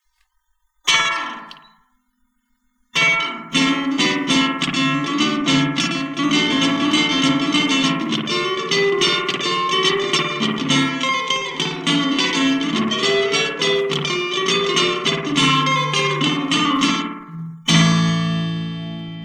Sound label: delay